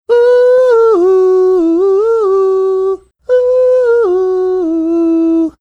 singing portion of song

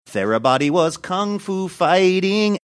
singing was

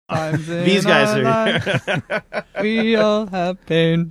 singing

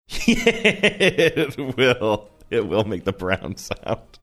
laughing saying it will make brown sound

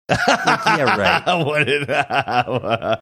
having a good laugh

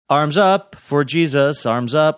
singing arms up for jesus

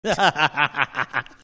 awesome laugh

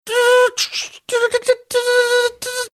making dot matrix printer sounds

